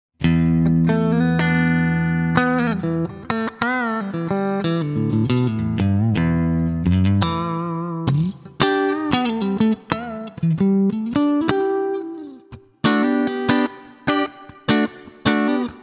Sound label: Ibanez QX54QM Pos. 3 Clean